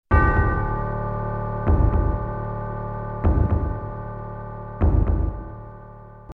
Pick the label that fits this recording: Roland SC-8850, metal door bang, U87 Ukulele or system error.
system error